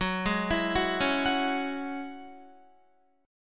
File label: Gb 7th Suspended 2nd Gb Guitar; Gb7sus2; arpeggiated